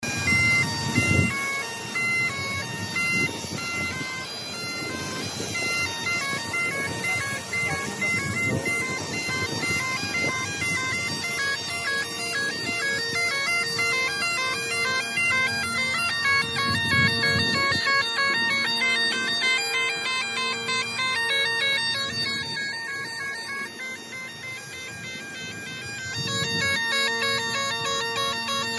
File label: bagpipes; SCOTLAND